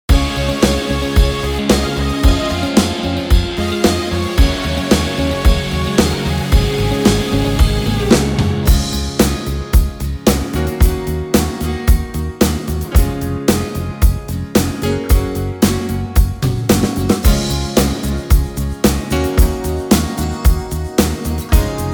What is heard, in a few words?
RAMA BEAT; POPeHOUSE